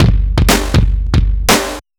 Distorted Kick & Snare

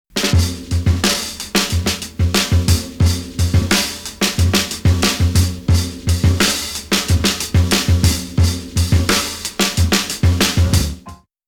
Audio Track, Hammond

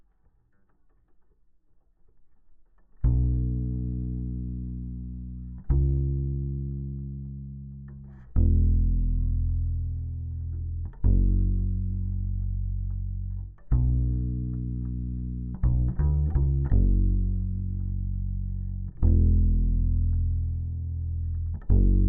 Bass DI

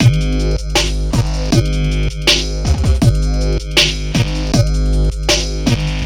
Modulation, Hammond

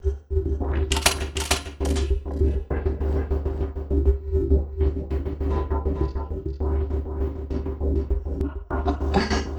Envelope Beat

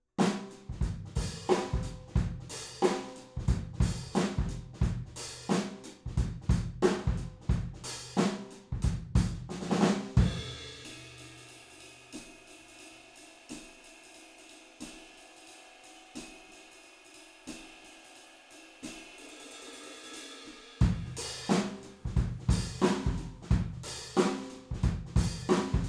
Room

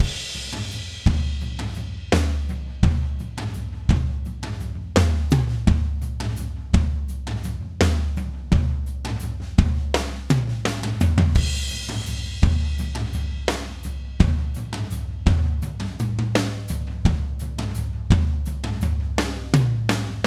tom beat

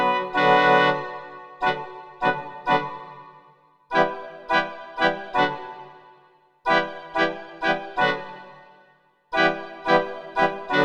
Hammond

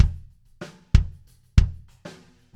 1bar kick

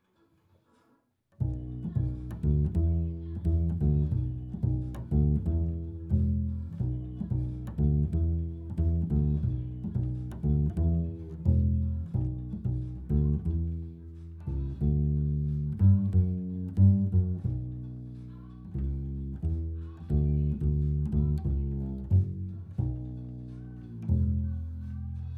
Bass Mic

